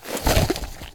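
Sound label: inventory helmet on; STALKER; IWP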